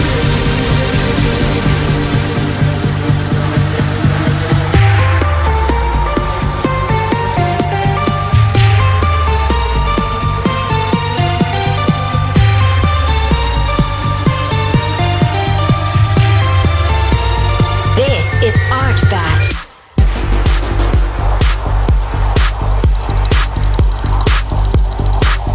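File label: latest trance ringtone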